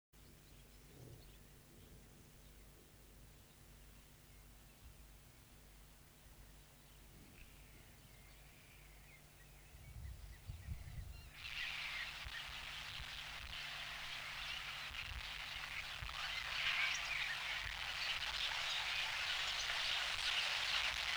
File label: radio active rain; after tsjernobil